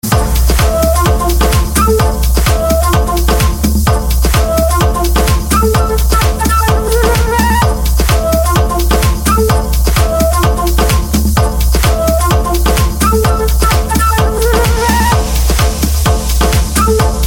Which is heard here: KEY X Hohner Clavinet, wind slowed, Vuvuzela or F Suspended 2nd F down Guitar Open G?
Vuvuzela